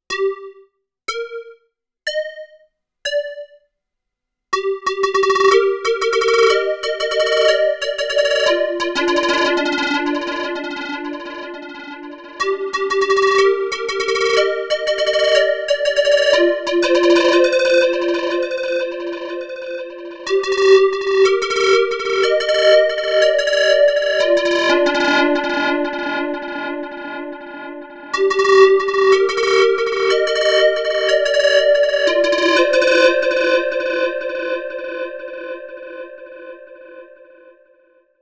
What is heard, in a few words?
Digital Pluck, Korg Dispersion